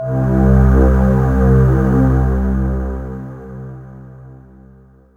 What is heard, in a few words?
Kawai K1r Pad C4